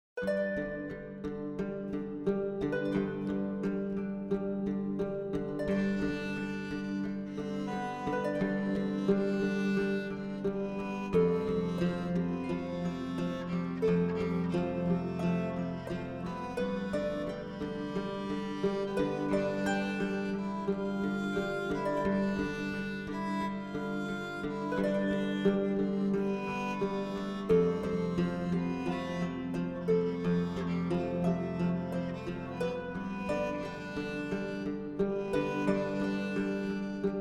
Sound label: Medieval Song